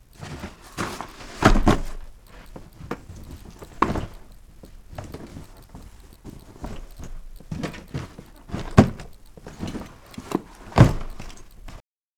Moving Boxes And Bags

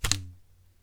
Balloon Flick

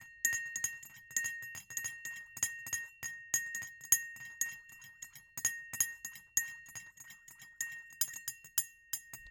Spoon Stirring Glass

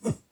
Swinging Staff Whoosh Low

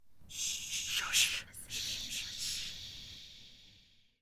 Group Shush

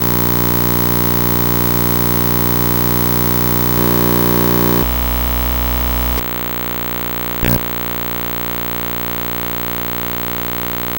Composite Video

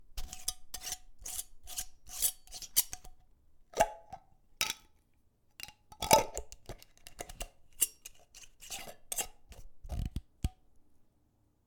Ball Jar Lid

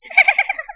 Funny Smile

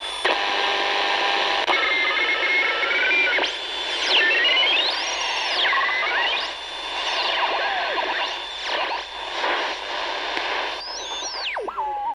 Noise

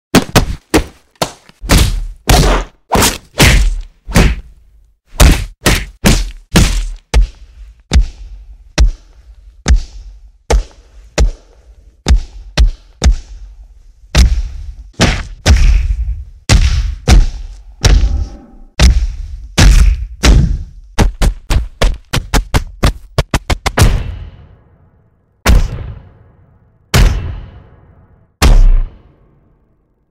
Punch Sounds Collection

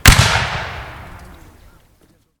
Musket Fire

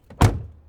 Car Door Slam Ext

